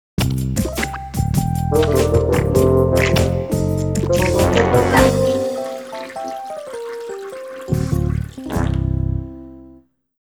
Sewer Zone Completion; frogger he s back

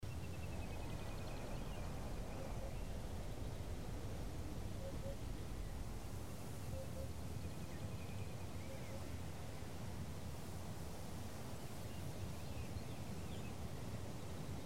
Wheat Field Ambience

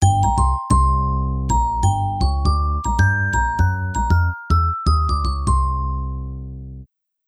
The Triumphal Jingle of Achievement; edna harvey the breakout anniversary edition 2008

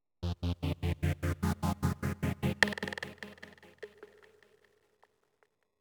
Oneshot Synth And Perc; zombie army 4 dead war ps4 stadia switch windows xbox one gamerip 2020

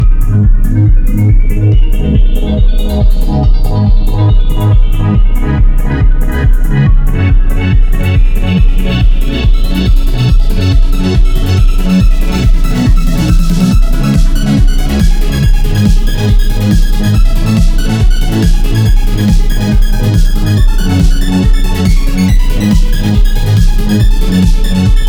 jumper

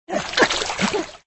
Fem Drown; Extra Lives

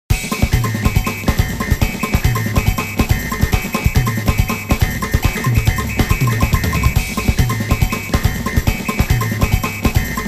Jingle Samba; Taiko no Tatsujin Wii Dodoon to 2 Daime

